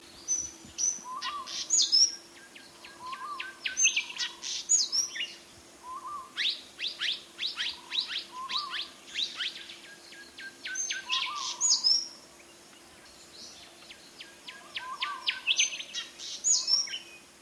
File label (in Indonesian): suara decu timor